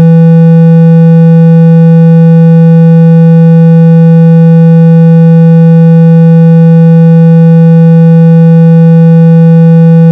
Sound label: low e tone